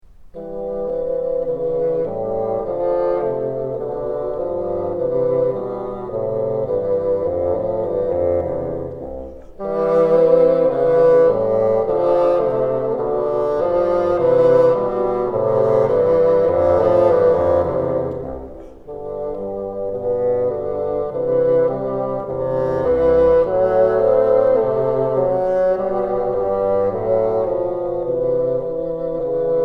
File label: together, bassoon